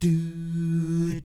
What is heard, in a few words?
DOO SFZ, Jazz FX; Spectrasonics; Vocal Planet; JAZZCOMBO